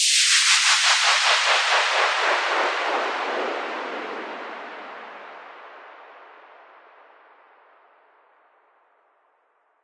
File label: nice soft noise hit; Electro and Synthetic